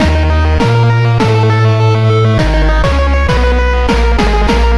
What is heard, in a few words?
alien, Electronic Loops